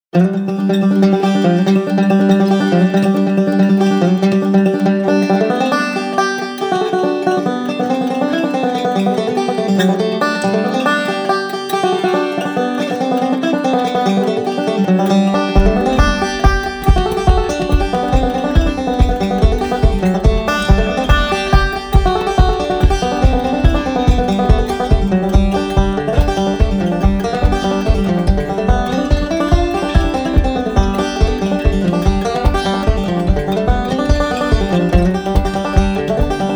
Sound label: polska, banjo; efter Carl-Erik Berndt